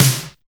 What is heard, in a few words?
HEFTY SNARE